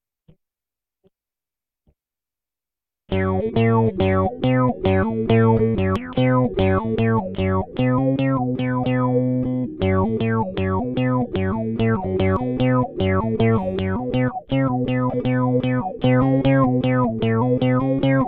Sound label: guitarix session